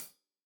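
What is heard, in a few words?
hat closed, hi-hat cymbals stereo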